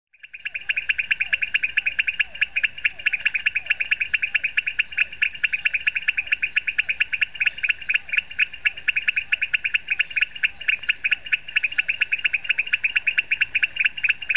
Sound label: Leptodactylus podicipinus